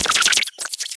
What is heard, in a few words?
nature fungal themed level, level up